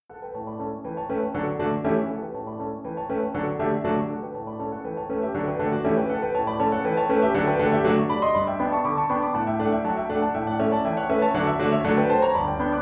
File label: Polka in D